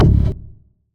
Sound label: Solid Moog Bass, Old Skool House; Big Fish Audio; OSH Bass